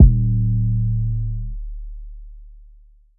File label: 808s